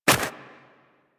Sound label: Armadillo Dig Snow; Warframe; Hunting Critters; Foley